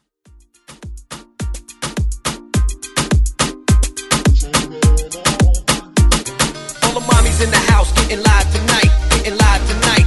Moombah Hype Edit

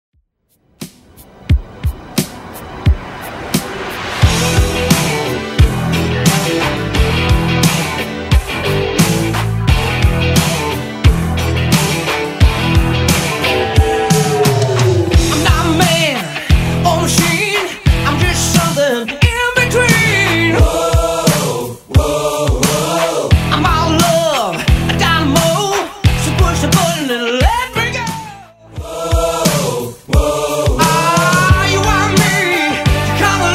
80s Rock Redrum 88 bpm

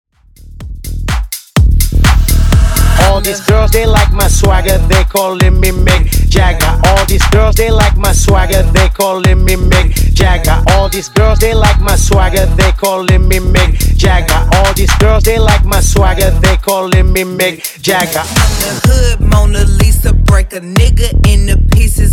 Bass House 125bpm; Top40

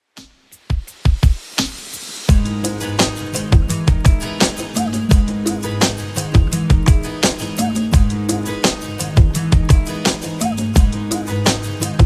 90s Redrum 85bpm

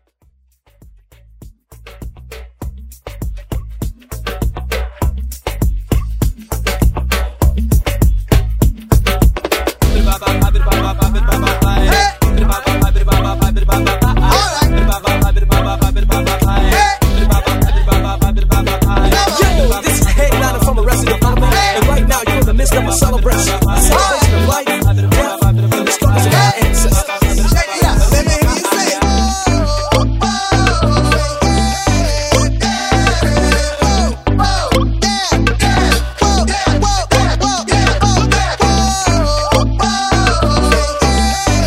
Moombah Vocal; Moombah Vocal Edit

